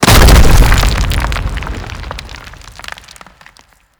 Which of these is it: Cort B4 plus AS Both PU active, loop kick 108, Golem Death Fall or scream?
Golem Death Fall